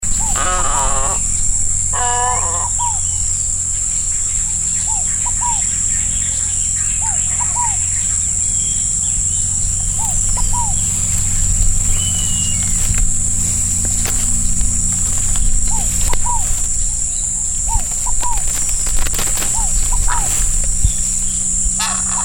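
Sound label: great white egret voice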